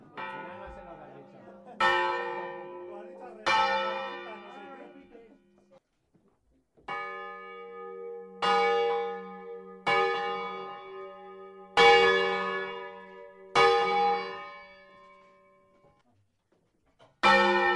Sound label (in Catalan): campana